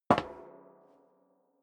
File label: step sneaker metal rattle single left; schritte